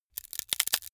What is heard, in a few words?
wood sticks small crack short